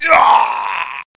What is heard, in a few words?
oooww; quake